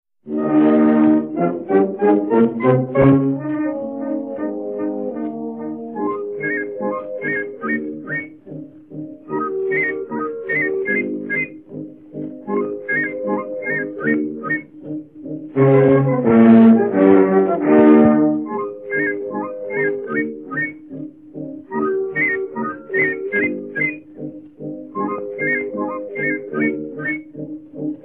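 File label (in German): Historische Flötentöne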